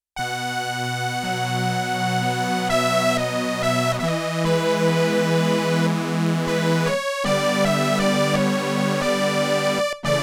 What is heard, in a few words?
Digital Filters, Prophet 5; Yamaha TX16W